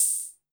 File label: HH Op, Roland TR-55; Drums